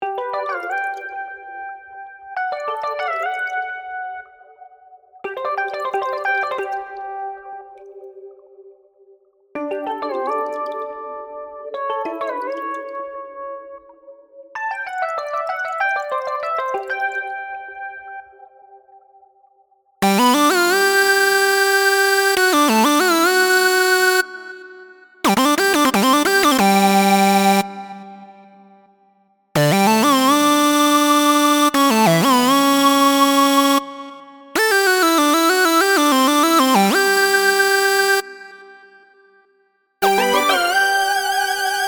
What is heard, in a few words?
Synth Same Melo; Silex